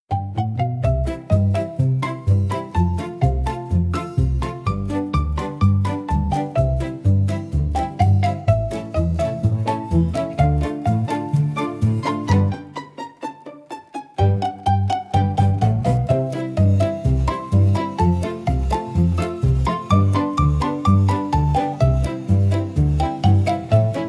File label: comical marimba for funny scenes